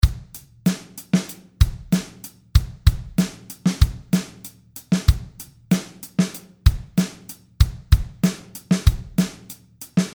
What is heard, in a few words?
Hip-Hop Drum Beat